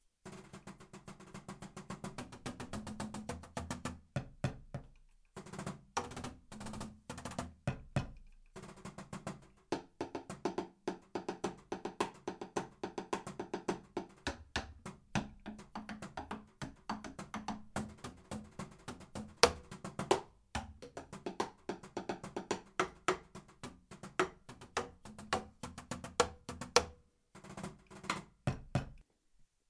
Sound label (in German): GEWA G3 Emissionen